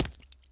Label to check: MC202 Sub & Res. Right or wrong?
Wrong